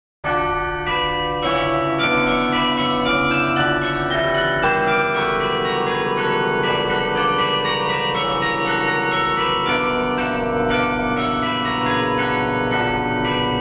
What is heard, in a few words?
minuet fadeout, carillon